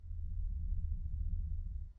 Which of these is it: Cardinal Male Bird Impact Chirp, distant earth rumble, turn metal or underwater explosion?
distant earth rumble